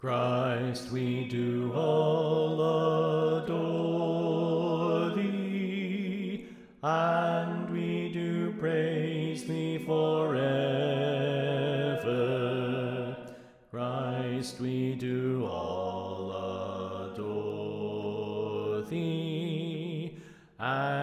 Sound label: Bass Left